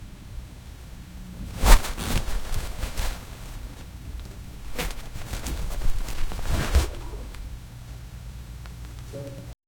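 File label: gentle ambiance soft rustle, bags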